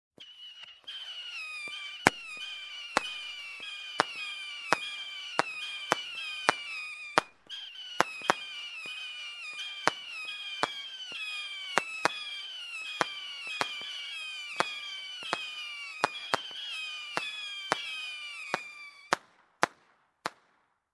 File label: Firecrackers with whistle